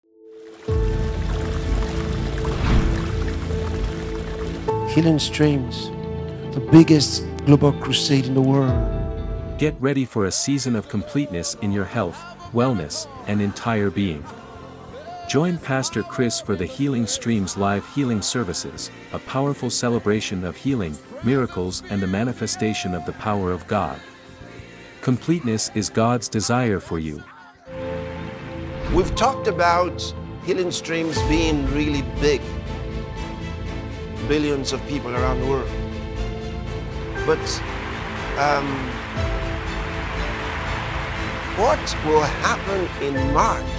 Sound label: JINGLE; HEALING STREAMS